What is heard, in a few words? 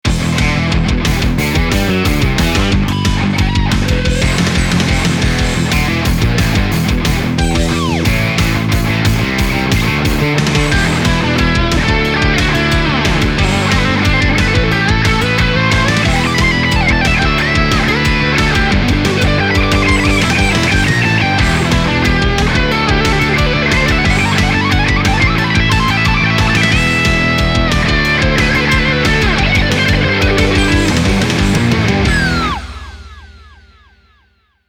METAL